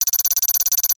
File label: technology data read out burst